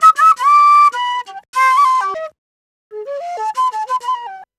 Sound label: Penny 98 bpm G, Penny Whistle, African